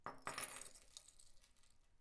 Putting down jewelry

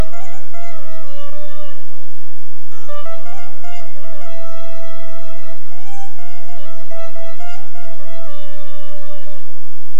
Oriental

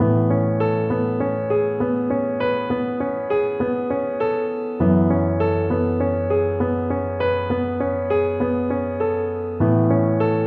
Home Romantic Piano

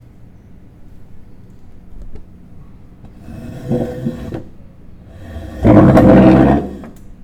Chair

